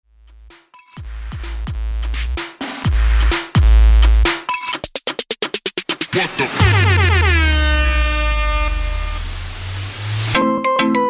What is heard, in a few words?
Transition 128 102 Bpm